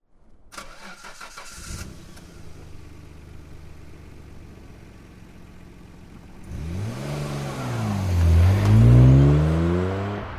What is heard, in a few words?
Start, R32; Ex Sound